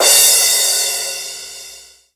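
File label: drum crash sound c sharp key, drum crash tuned to the C# note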